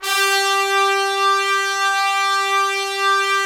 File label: Trumpets, BRS TPS 29R; Roland - LA Composer vol. 4